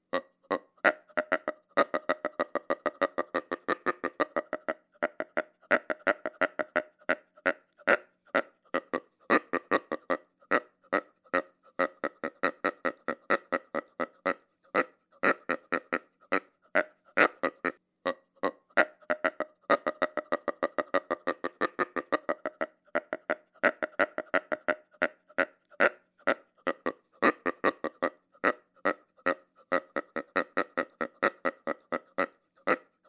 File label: Single hog feeding; Dave Kelso